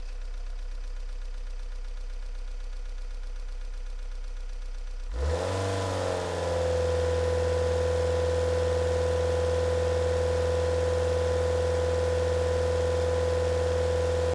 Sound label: revving an engine without combustion, electric motorcycles